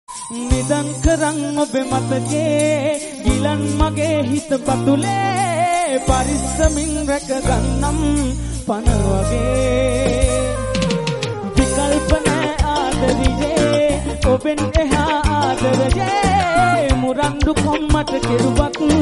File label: bengali romantic